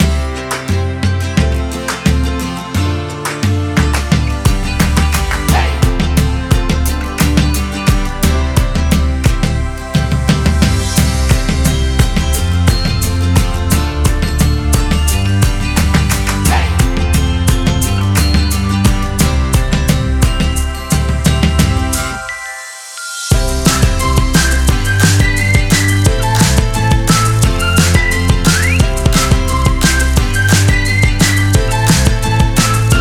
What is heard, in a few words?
Upbeat Happy Mood; Good Morning; HAPPY/UPBEAT